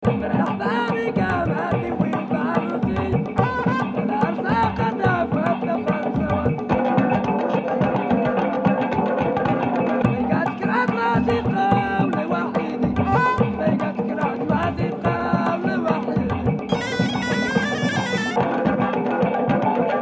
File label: Morocco Loop